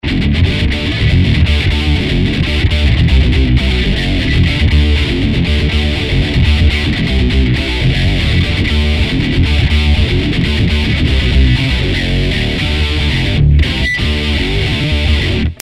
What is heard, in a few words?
Octave metal